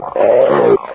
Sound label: pain, zombie; svencoop; hl2